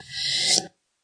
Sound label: breath; REMIXABLE COLLECTIONS; Ze Franks Pain Pack; pain pack percussive and bips and clicks